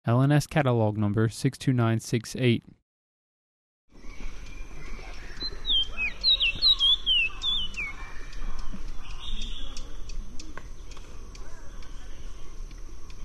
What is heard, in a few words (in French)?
chant